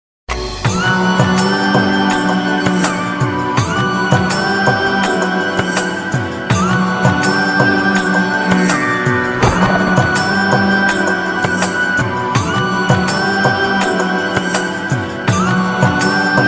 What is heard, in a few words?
sad ringtone heart broken ringtone